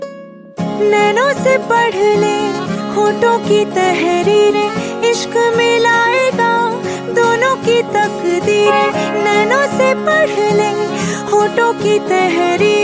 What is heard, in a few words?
Bollywood Song Ringtone